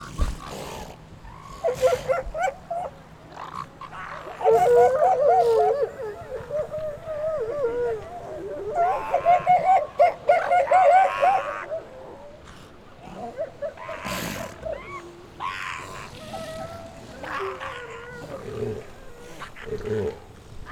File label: Seals Ocean Vista Point XY